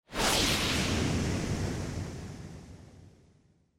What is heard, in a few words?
Motion Speed Lines Low Short